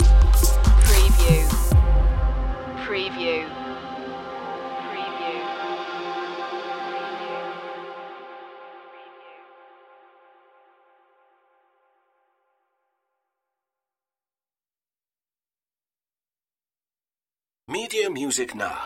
daylite stinger

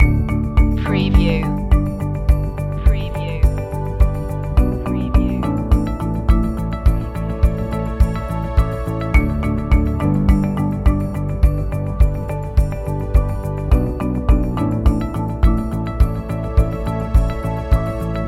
bike ride loop

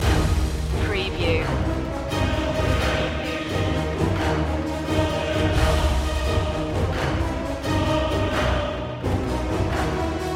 dark destiny march

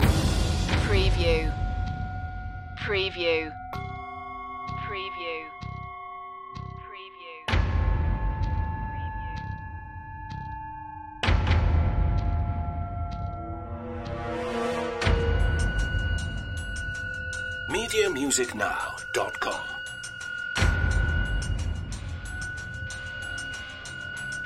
industrial eeriness loop

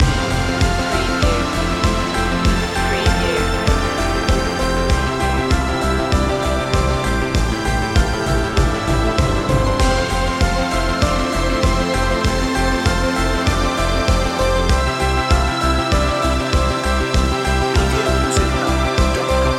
scandinavian light loop

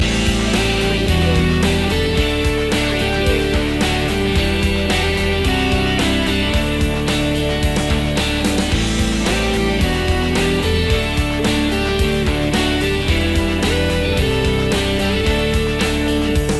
poprock loop

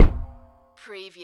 CARTOON RUBBER HIT

